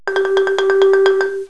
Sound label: collide; rawiswar